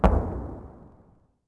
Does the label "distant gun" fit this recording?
Yes